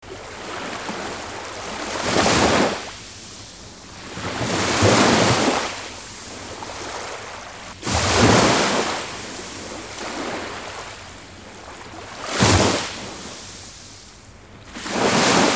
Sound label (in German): Meeresrauschen - Entspannung fuer Koerper und Geist